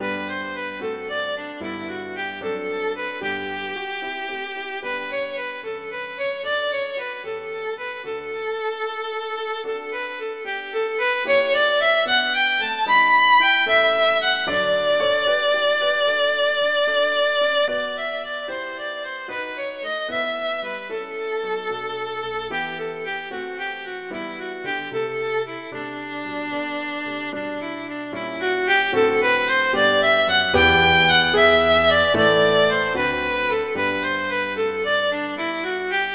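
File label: Allegretto, violin; Elgar; Melodious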